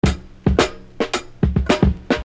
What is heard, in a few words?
Beat, Hip-Hop Street Beats